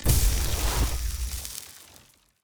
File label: Crystal Start Working; TANK; 3Souls